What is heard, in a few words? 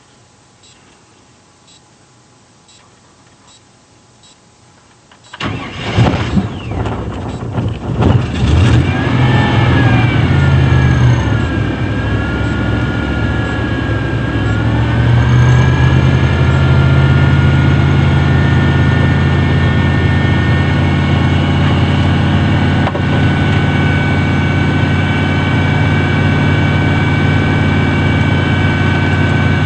motor Good